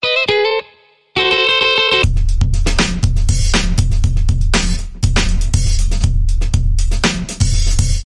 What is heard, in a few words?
Serious Whimsy Percussion Bumper